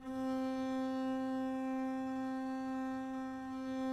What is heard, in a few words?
c4 down, arco looped; meatbass